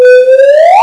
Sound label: slot siren; Boxcars Casino